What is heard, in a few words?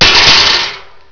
GLASS